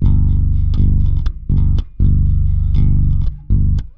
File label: Rock, Bass; Bass X